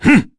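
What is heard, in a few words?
Vox Attack, Korean; heroes; Bernheim; kingsraid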